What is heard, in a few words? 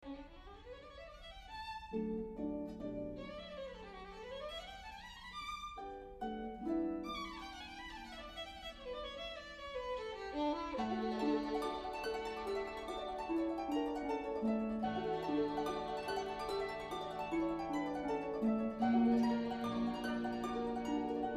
Harp And Violin; Faure; LIVE Performance